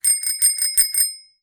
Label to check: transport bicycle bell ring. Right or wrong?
Right